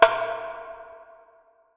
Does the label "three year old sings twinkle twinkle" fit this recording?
No